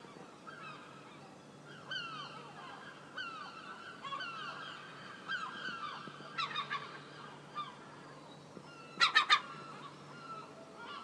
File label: seagulls getting lively in brighton this evening a downpour brewing